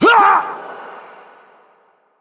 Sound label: Wound; Bolvar Fordragon